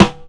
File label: original snare drum sound c key